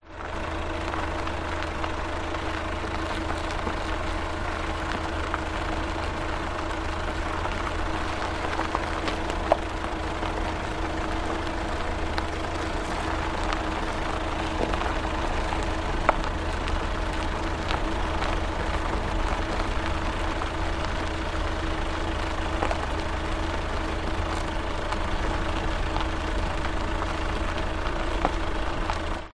vehicle car suv drive slow gravel road track close to tyres